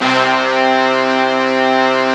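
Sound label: Brass Ensemble, Roland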